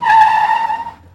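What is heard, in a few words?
Cadillac Skid To Stop; Cadillac 1972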